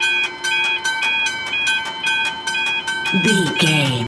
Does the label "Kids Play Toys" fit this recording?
No